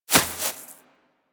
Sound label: Rodent Hop Step Rear Grass; Characters; Foley; Warframe